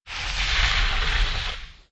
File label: Skiing Snowboarding Slowing Down And Stopping; mono; Lynne Music